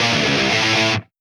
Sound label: Rhythm Guitars; Ascension; Sony; ACID Pro 7.0